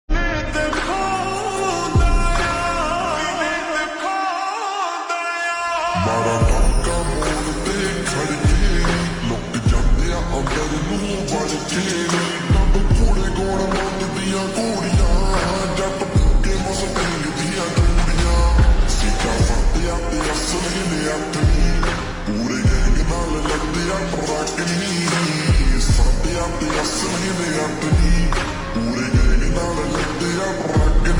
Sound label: Ringtone Slowed Reverb